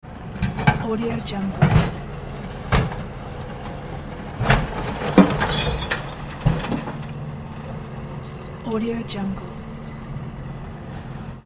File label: garbage truck working